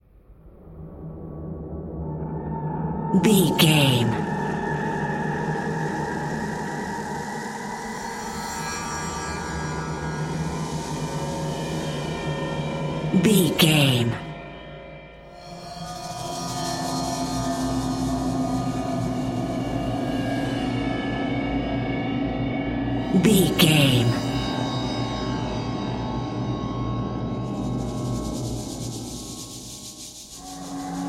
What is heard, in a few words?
sinister horror tension building bed